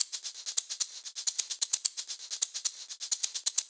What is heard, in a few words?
Live Percussion, 130 BPM; Sonic Foundry (Sony Creative Software); Underground UK House & Electro